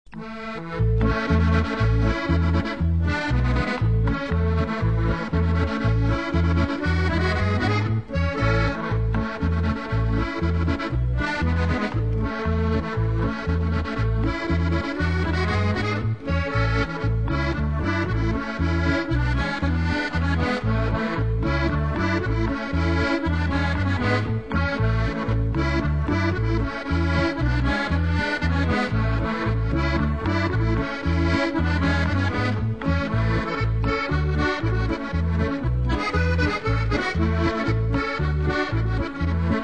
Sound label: Skotsk; Skotsk 4 etter Harald Haltvik